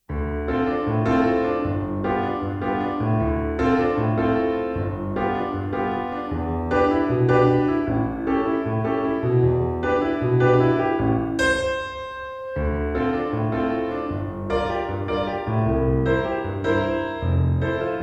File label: piano pop